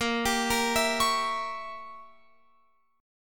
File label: A sharp Diminished 7th, Guitar Open F; arpeggiated; chordshape